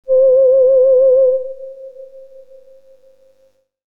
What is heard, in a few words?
Whistle